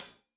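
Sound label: hat closed, hi-hat cymbals stereo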